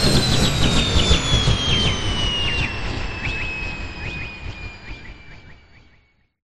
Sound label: stop; falcon